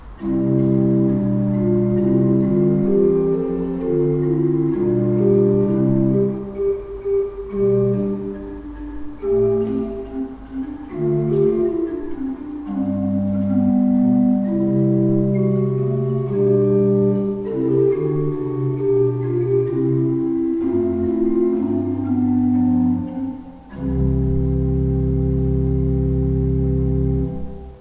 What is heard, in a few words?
pipe organs; Paul Ott 11 II P